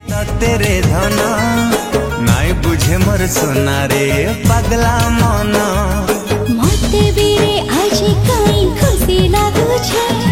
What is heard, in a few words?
Sambalpuri Song